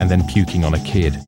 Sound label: puking on a kid; Voice; actions